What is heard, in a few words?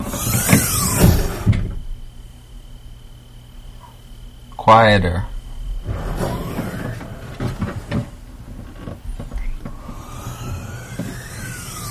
Honda Civic Hatchback Hydraulic Source Take